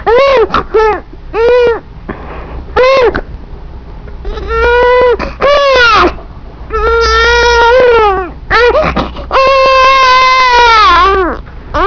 BABY

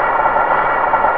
CARTROLL; JOHNNY

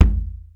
LOW KIK; BEAT2000

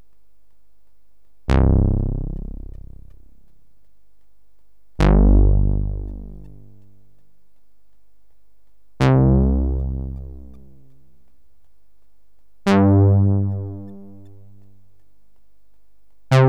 TAURUS; cubase; BUILDERS; MGTAURUS

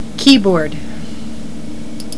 KEYBRD

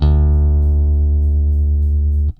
BASS, ROCK POP; MAGIX

